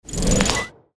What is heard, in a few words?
play instant; jinteki